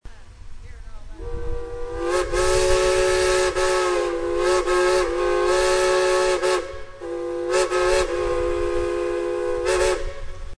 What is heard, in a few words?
3chime, steam whistles